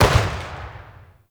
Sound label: Vial Ability Blast Shatter; Warframe; Warframes; Alchemist; Elements